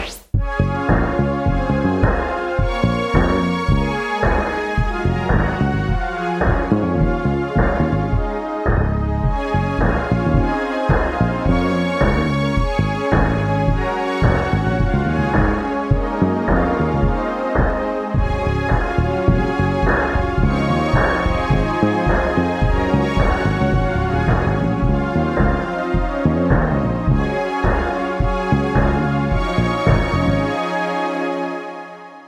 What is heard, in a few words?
home alone, testing pitch bend; Jackdaw